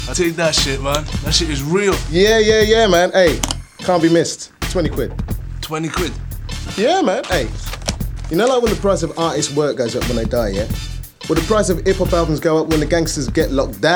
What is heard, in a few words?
hip hop outro